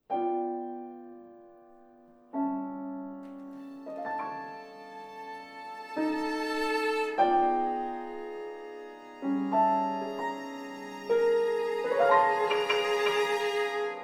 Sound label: Piano Trio